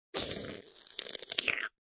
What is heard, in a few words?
Slurp, Slurping sound.; Slurping Sound Effects; Human